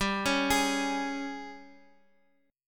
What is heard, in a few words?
Ab Major 7th Suspended 4th G